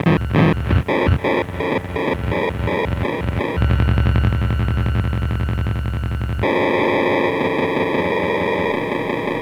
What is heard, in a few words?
Haunted Transmission; Sonic Foundry (Sony Creative Software); Loop Noir